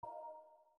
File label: want to cry